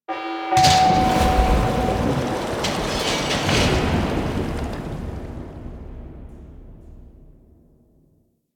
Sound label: Forest Water Drain; Warframe; Ambience; Grineer Forest; Grn Forest Spy Vault D